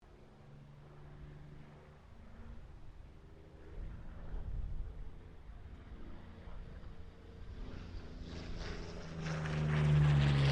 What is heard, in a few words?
AIRCRAFT P-40 KITTYHAWK PASS STEREO; Airplanes